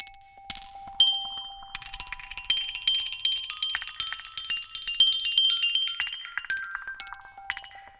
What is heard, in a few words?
Color Xylophone Loop, Ethnic Instruments; Sonic Foundry (Sony Creative Software); The Electronic Music Manuscript Collection